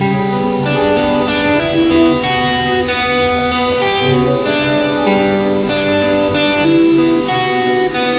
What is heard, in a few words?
musical